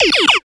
FX Dance; Sound & Vision - Gigapack II

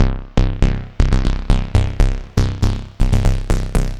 Heep Bass, Bass Foundations; Sonic Foundry (Sony Creative Software); The Electronic Music Manuscript Collection

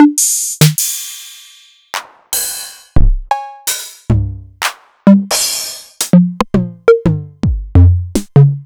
Novation Drumstation

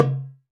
Tabla Dom; Roland BK-5; Percussion

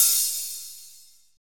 HAT, hi-hats; AMG; Now CD-ROM (Roland); NOW! Drums

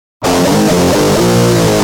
G Jam, Guitar; Industrial

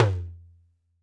percussion, Roland TD-20

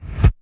BD, Dance Mega Drums; 90 s Sample CDs; Best Service

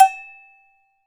Agogo Lo; Akai MPC500; LA Perc

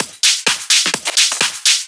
Discohouse Loop 128 BPM; Best Service; House Definition VOL-1

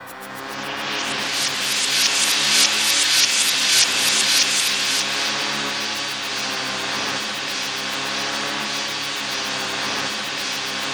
INSECT, Special FX Twisted; USB Soundscan; AKAI

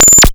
Morse Coder Quintuplet; Cwejman S1; DevSnd Cwejman S1 - JK

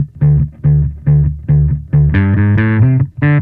Bass

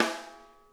RIM, snare; Sonic Reality; Interactive Drum Kits

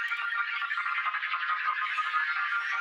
Tonal Re Draw, sparse soundscape

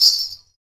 PRC METAL, Afro Shakers, Ankle Pods

